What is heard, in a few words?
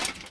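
Armour Movement; Civ4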